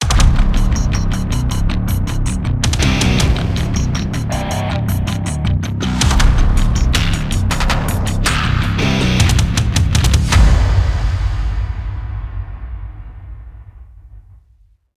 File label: Multiplayer Level Start; modern combat 4 zero hour; android